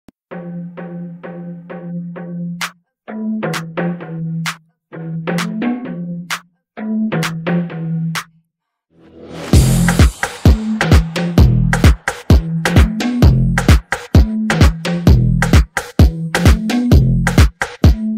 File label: instrumental